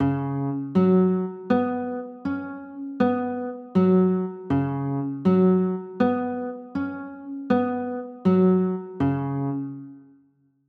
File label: d flat sus4, chords; d flat 7sus4